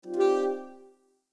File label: Jazz slide open; Nokia Ringtones; Audio themes